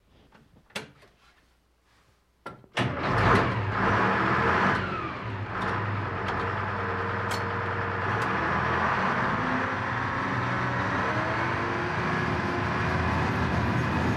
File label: APC Int Startup Drive, armored personnel carrier